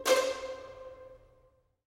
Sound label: pizz